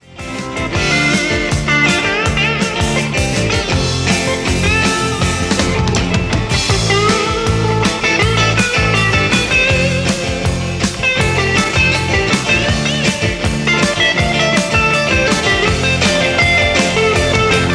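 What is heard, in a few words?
Fender 61, guitars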